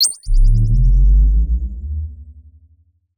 bomb start; CrossCode

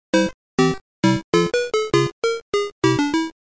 Game Over; supermarioemulator

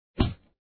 Box hit; coolmathgames

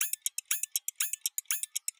bomb tick; CrossCode

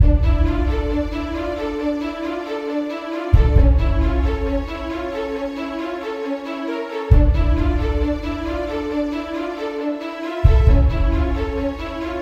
music

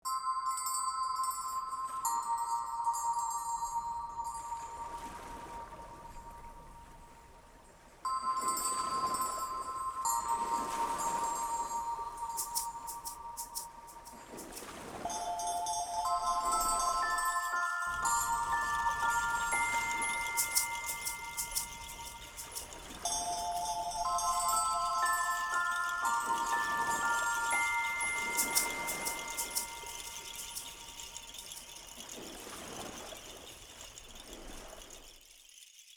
music book

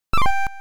note fx; seagaia; ld22

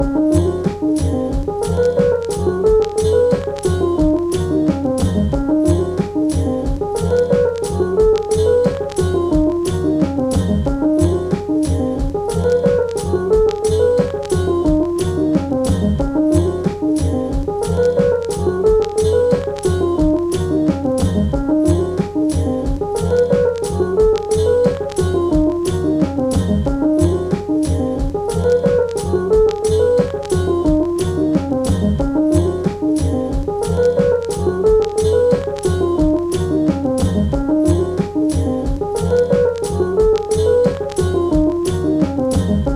Instrumental

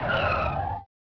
skid

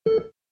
beep; marubayashi; heart

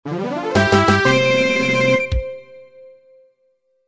fanfare

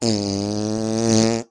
fart

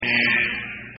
alarm buzzer

